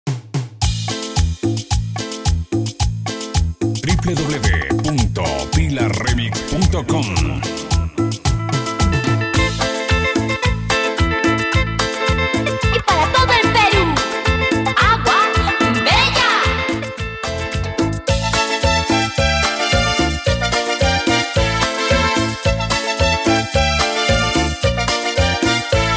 Intro Reloop, 110 Bpm

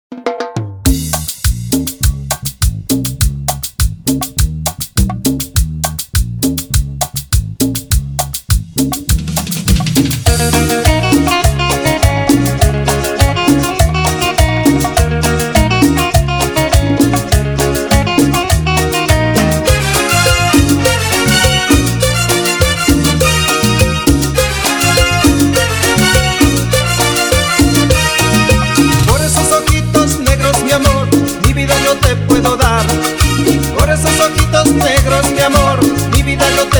Extended Cumbia 102 Bpm; Baranquilla

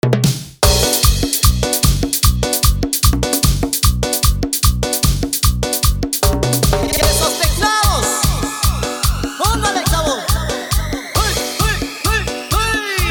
Cambio De Ritmo 150 to 122, Mosaico, Intro Clean; ecuador

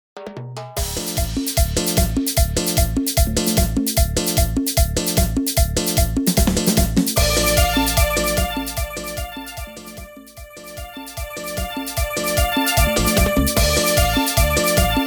Instrumental Cover, 150 Bpm; Cover Project

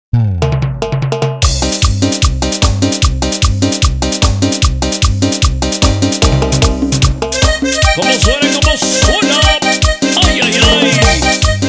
Instrumental Chicha BPM 150

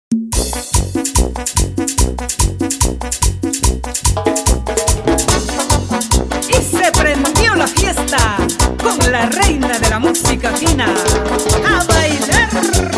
Intro Simple 145 Bpm

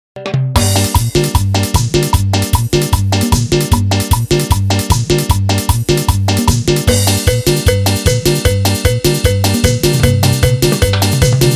Instrumental Bpm 152; Dimax Dj Mix

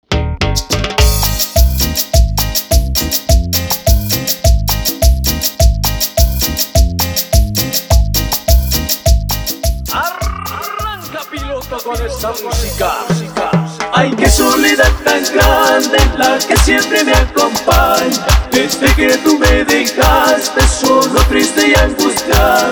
Intro Acapela, 104 Bpm

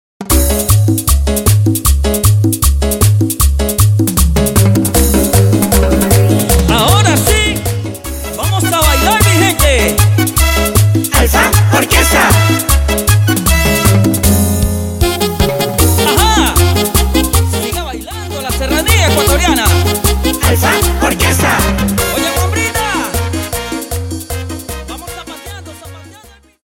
Orquesta Intro Simple 155 Bpm

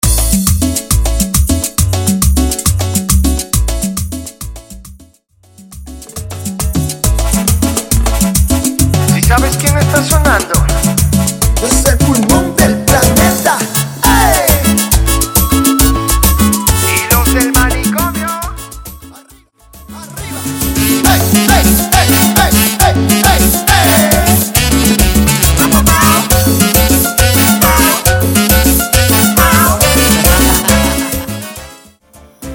Intro Simple, 137 Bpm

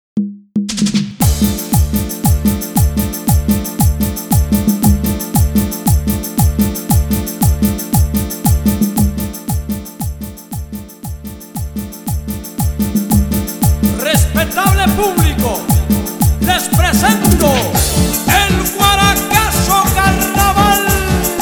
Simple Bpm 116

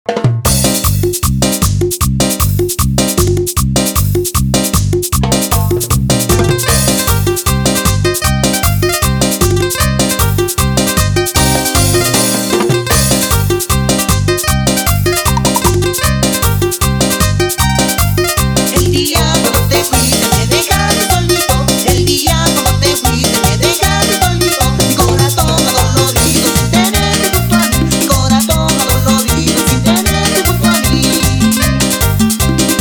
Cover Instrumental Oriente Amazonico; Dimax Dj Mix; bpm 153

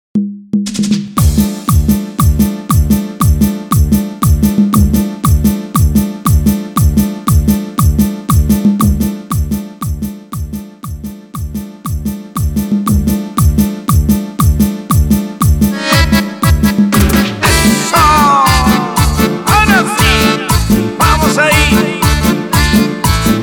Intro Simple Bpm 118

